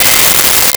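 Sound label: Mute Trumpet C5; Nintendo; July 2020 Gigaleak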